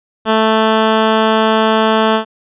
Waveform Rectangle

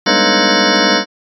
cluster open semi

